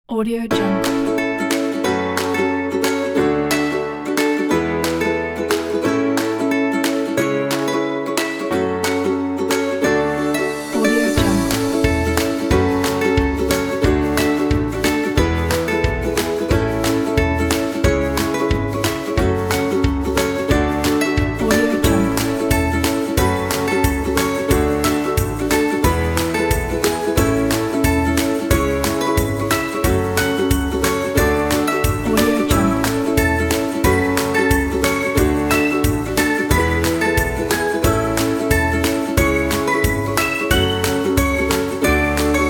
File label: cute animals pack